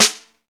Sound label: SNR, Roland; Real Snrs